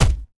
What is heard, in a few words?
Mosquito Wall Hit; gamerip